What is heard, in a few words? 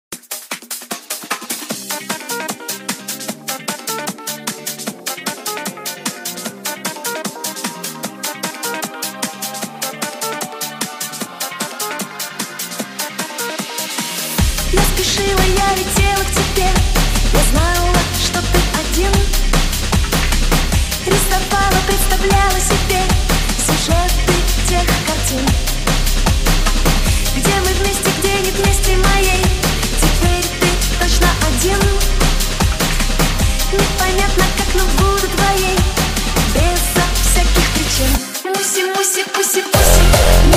hardstyle